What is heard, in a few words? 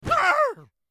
Character Pirate Hit; Angry Birds Epic